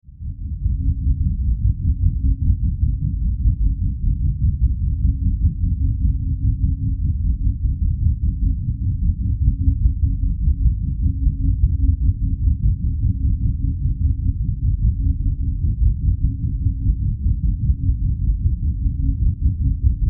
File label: Science Fiction Power Drone Throbbing Pulses; Sound Design; Drones